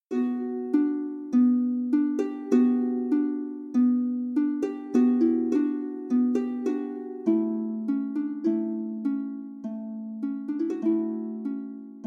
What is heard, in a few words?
intro sound greek